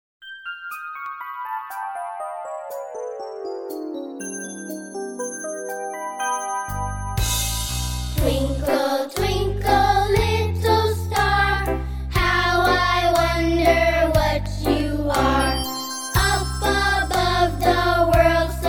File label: Twinkle Twinkle Little Star